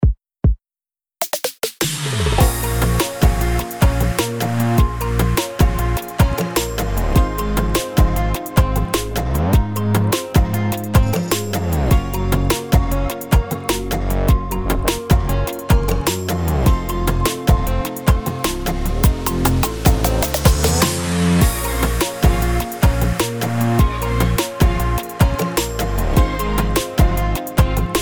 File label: bastaki modern